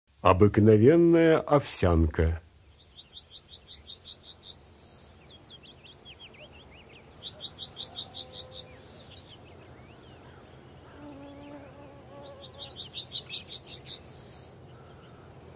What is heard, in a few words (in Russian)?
obyknovennaya ovsyanka golos